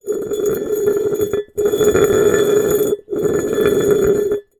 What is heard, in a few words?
glass jar large slide concrete ground; household